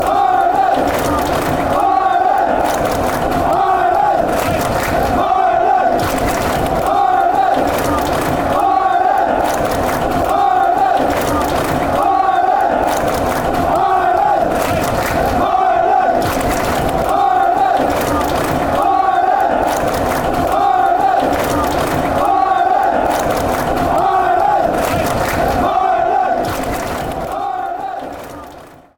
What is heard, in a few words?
ireland clap, chants